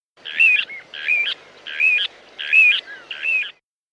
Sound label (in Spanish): chorlitejo chico